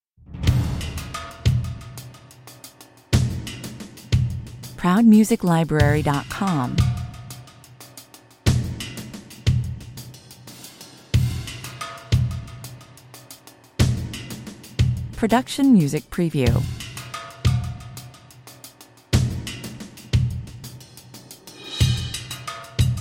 percussion only

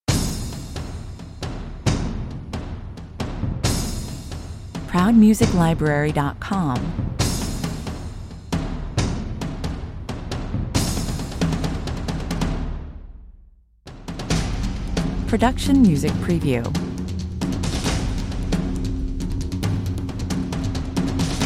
percussion only

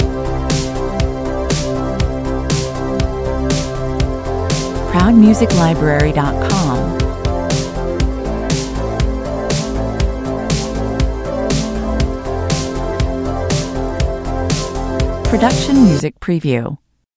synth wave loop

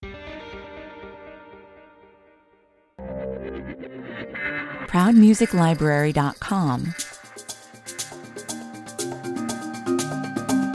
conqueror 120 bpm

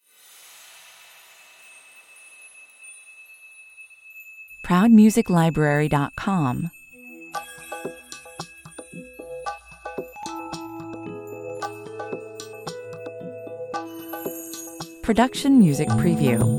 relaxing maharaja (background bed) 112 bpm

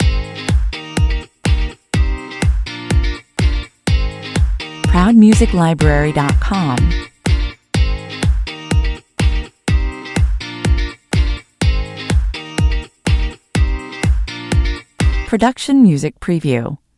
beach dance (loop)

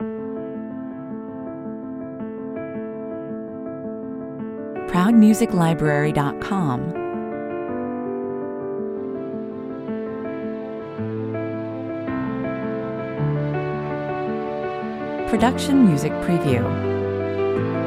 the moving piano; underscore version

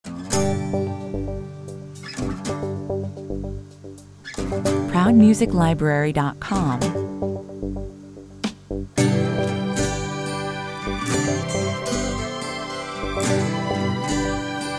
acoustica 111 bpm

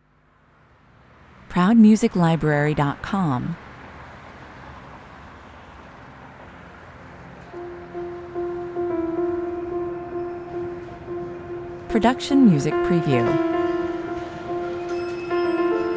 corporate hotel 110 bpm

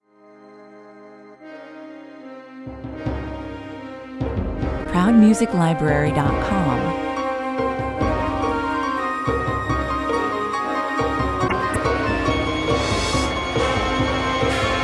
scary stab

